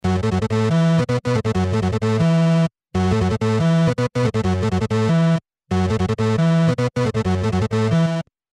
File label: Game Ultrafast Hippie Beatnix Theme; 8 bit - Gaming - Schnell